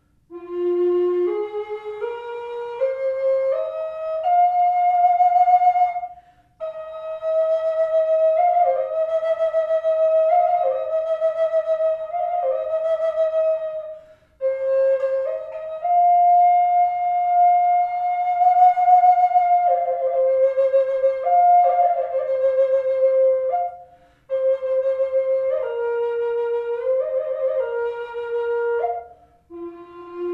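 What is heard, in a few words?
reverb, flute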